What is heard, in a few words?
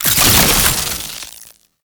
Alchemy Grenade Pickup Electricity; Entrati